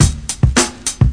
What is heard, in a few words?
simple tone bounce, 107 bpm, drum loops and breaks; 101 to 110 bpm